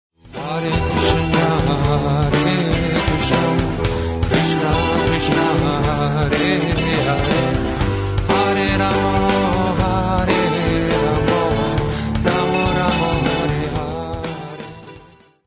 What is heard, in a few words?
Hare Krishna, Electro Punk